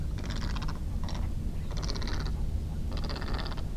Great Egret, Silberreiher

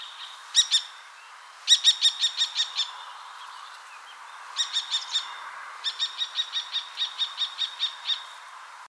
Common Kestrel, Turmfalke

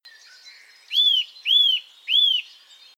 Eurasian Nuthatch, Kleiber

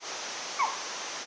boreal owl - call, Raufußkauz

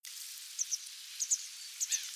Grey Wagtail, Gebirgsstelze

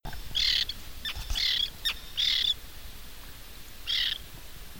Common Tern, Flussseeschwalbe

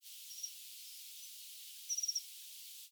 dunnock- flight call, Heckenbraunelle

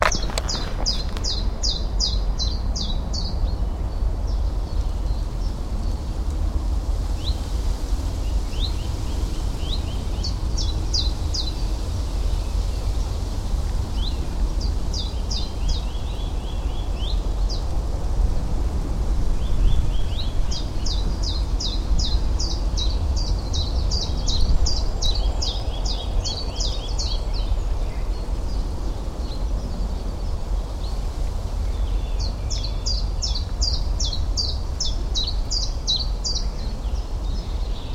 Common Chiffchaff, Zilp Zalp

